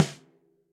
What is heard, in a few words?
Snare & Press Classic Muffle; Drum Hits; Ableton; Factory Packs; Session Drums Studio; MPE Hits